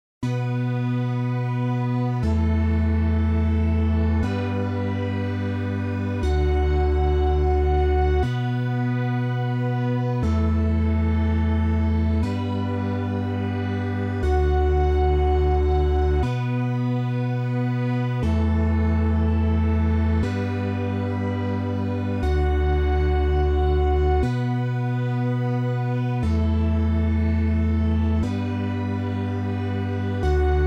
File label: Instrument